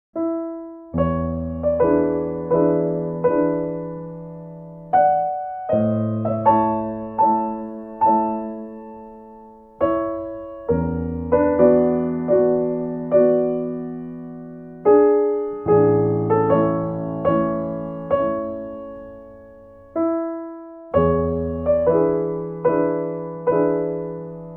Romantic Piano Classic Piano, Serenade Short Piano Songs